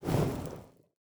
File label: rain Of Fire loop End; tenshi